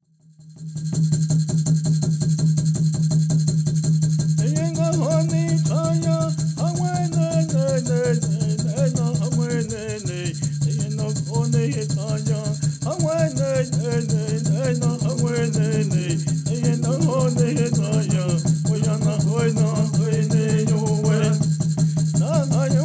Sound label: Intertribal Peyote Song